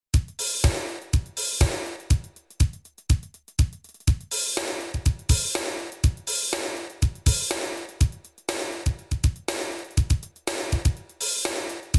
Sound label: drums, Roland JV880